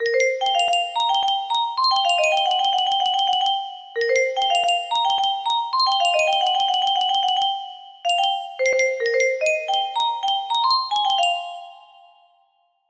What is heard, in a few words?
music box